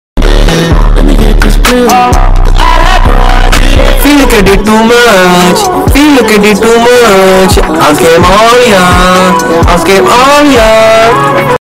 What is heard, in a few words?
Ringtone